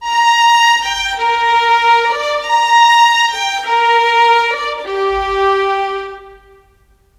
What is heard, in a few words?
Violin, Cinematic; Sonic Foundry (Sony Creative Software); Orchestral Series VOL-3 - Cinematic